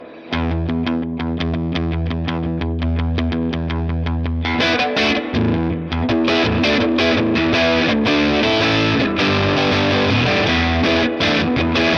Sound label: ultra verb rock, Swart STR-Tweed